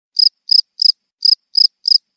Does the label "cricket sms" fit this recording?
Yes